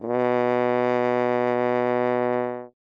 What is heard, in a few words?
French Horn ff B2